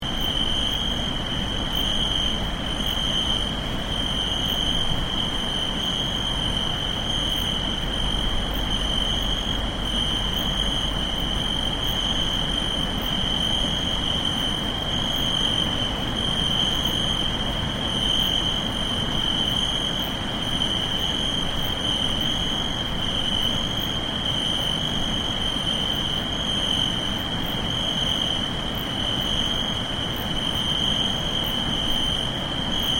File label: crickets near the trebbia